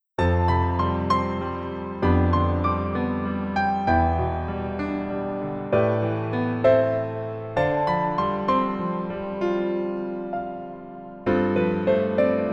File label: Piano ver